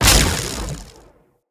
Voltaic Sword Projectile Impact; Characters; Enemy; Abilities; Sinecure; Banneret

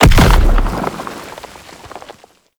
Small Bomb Explosion; Gameplay; Warframe; Tau

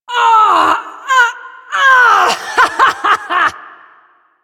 Lift Laugh, Corpus Lich; Warframe; Persona B

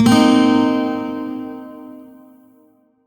Shawzin Blues Chord L; Warframe; Lotus Shawzin

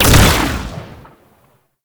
Concrete Archgun Shot; Warframe; Archwing

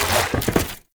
Arcade Dash; Warframe; Ambience; Nineteen Ninety Nine

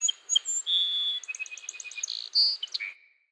Tree Birch Bird; Trees

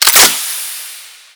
Rifle Reload; Warframe; Weapons; Tenno; Rail Jack

